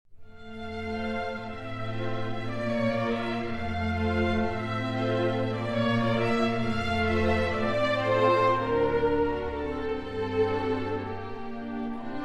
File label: CHANT SANS PAROLES, Symphony Orchestra